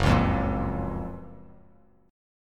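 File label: G flat Major 7th G flat; GbM7 strummed; JGuitar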